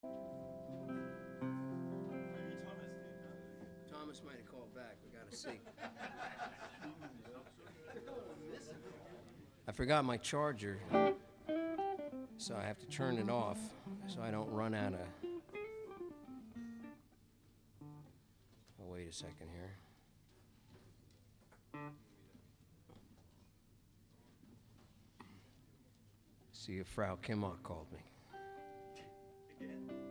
Tuning

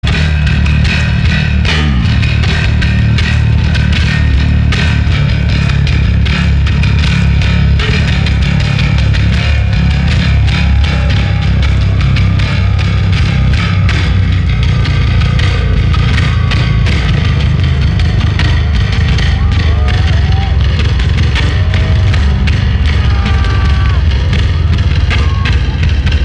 Bass Solo

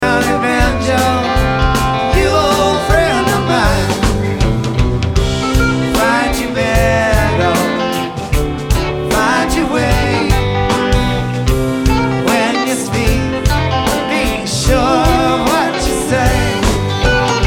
Banjo

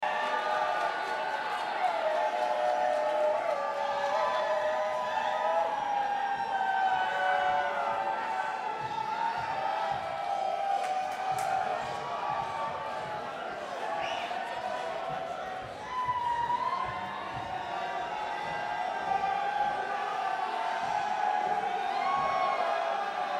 Crowd

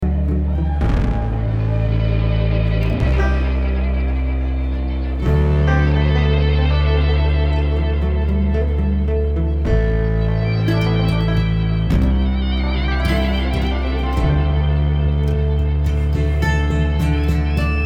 Traffic Jam

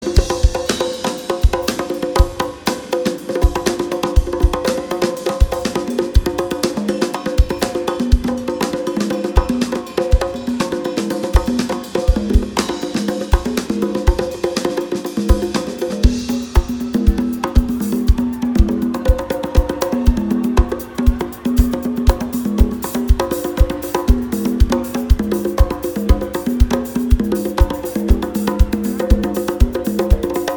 Drums and Bass

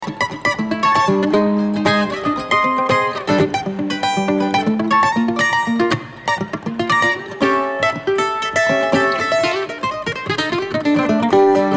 Kentucky Mandolin

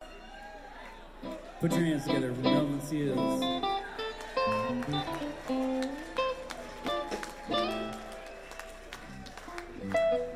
tuning

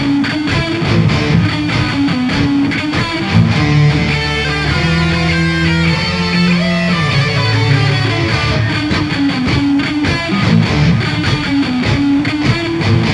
Guitar Solo

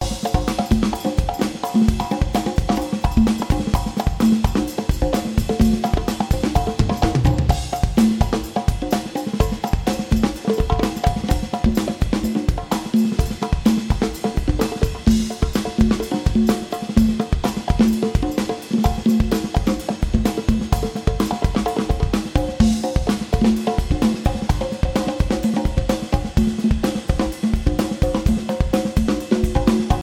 Drums

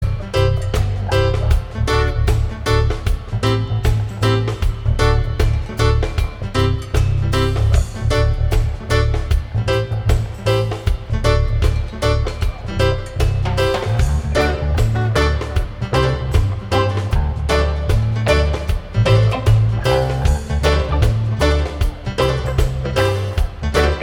Reggae Jam

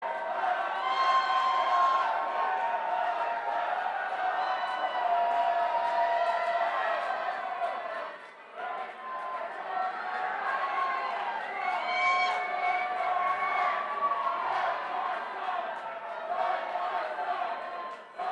Banter Crowd

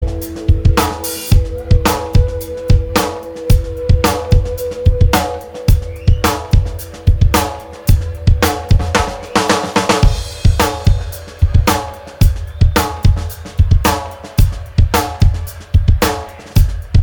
Drum Dub

